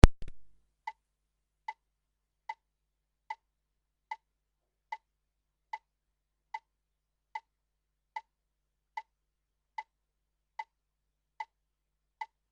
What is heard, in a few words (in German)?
Xylophon